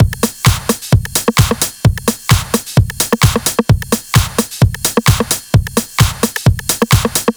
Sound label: 130BPM Kit; Pressure 1 Kit